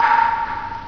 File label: PONG; sound effect